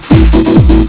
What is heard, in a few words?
tekkno